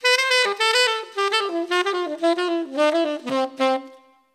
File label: Innocent Falling 8beat Dmaj 110